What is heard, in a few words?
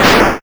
sfx magnum fire; duck game